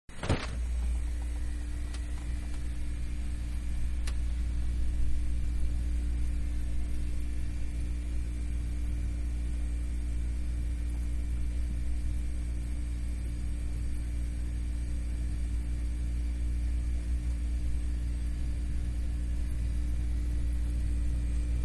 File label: Refrigerator Enter And Leave; Refrigerator Doors; Manhattan Production Music